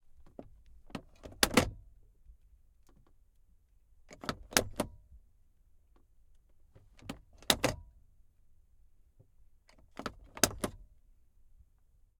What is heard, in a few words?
john deere tractor blinker lever; Sonniss GDC